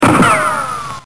Ric gun

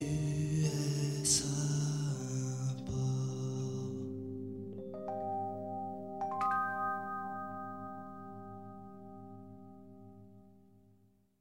Jingle

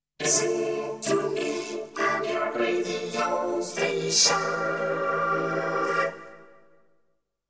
Jingle

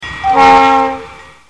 Train

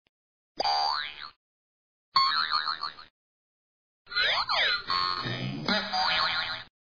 Boing

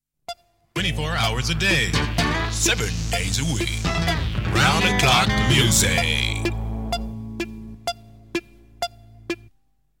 Jingle

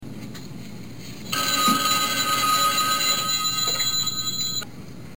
Ring

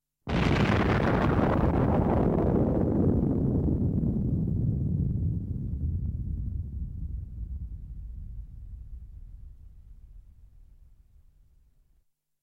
Jingle

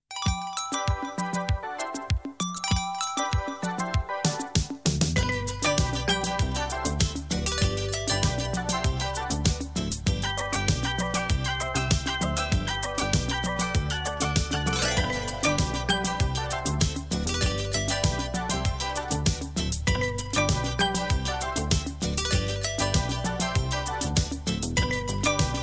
Jingle

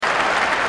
Bravo

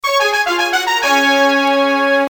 Brass

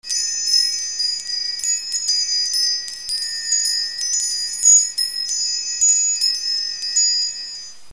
Windchime

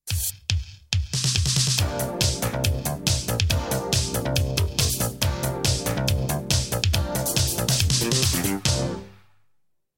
Jingle

